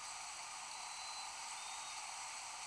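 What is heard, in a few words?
Black-capped Chickadee song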